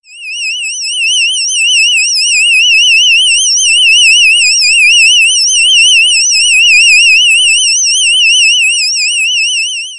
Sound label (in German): Pfeifen lang